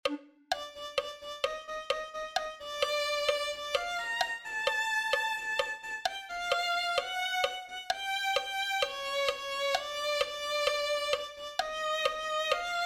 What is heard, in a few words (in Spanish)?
violin1